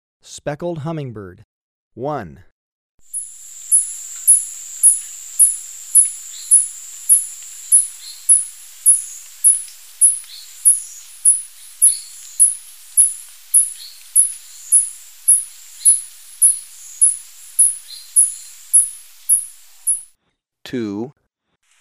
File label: Speckled Hummingbird; S. America